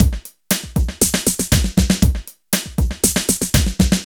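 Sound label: drum loops, right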